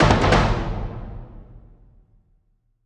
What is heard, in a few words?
Drum; Chrono Ark